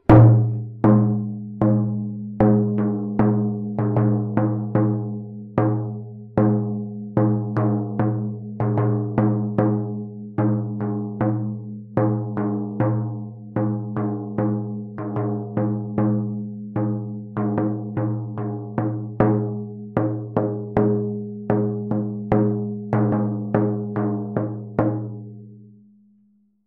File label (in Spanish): tambor navajo corto 40cm